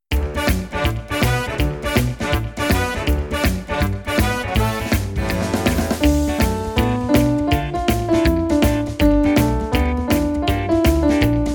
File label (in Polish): rock and roll akompaniament